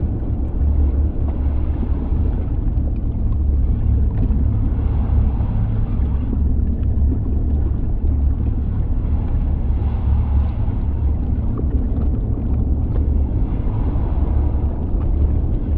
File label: ambient molten world; Stellaris